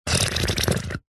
balloon pump in slime gunge release air hiss bubble; zapsplat